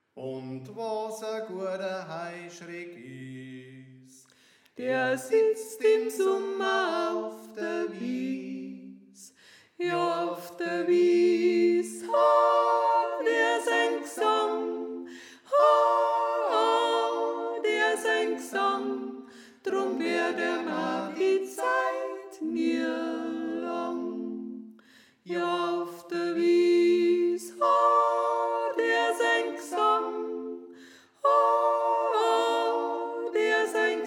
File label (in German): Sopran; Und Was A Guata Heuschreck Is